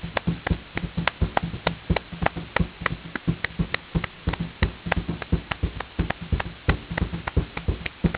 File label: percussion